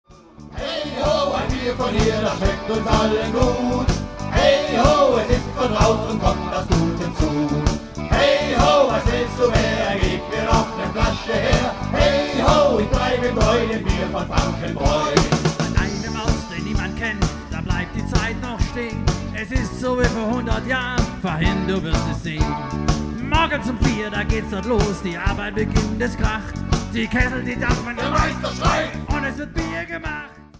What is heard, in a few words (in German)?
Song Klingelton; Frankenbraeu; Riedbacher FRANKEN BRÄU